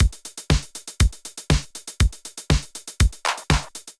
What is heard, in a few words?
707 Kit groove 120 bpm; MIDI Clips; 111 - 130 bpm; 707 Funky Beans Kit groove; Ableton; Factory Packs; Drum Essentials